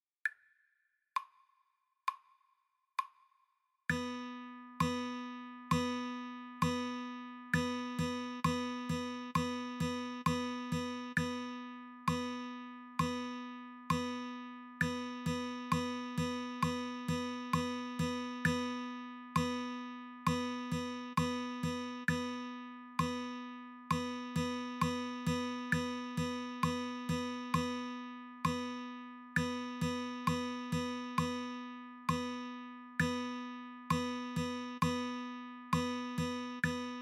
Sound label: 8th and quarter mixed, guitar